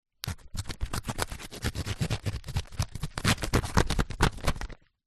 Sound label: paper sheet cut with serrated knife; foley